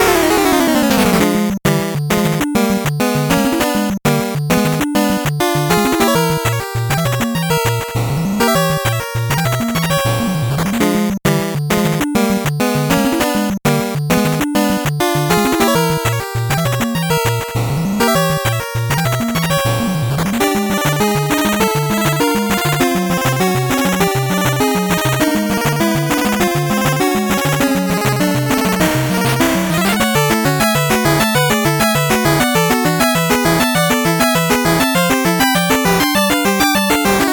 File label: 8bit bgm fast